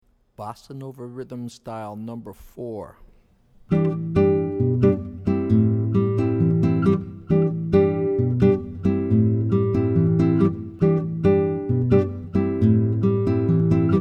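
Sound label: Bossa Rhythm Style, guitar; jazz chording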